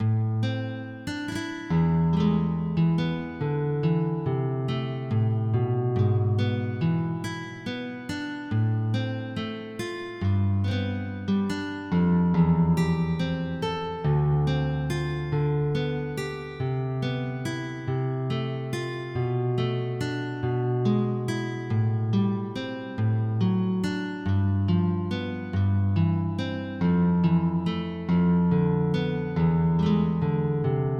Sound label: Prélude La mineur, MIDI